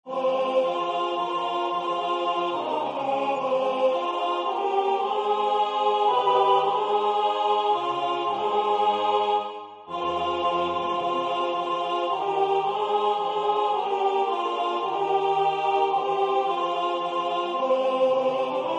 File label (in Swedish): Folkvisa från Gotland alt